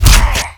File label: attack; guns n zombies 2014 pc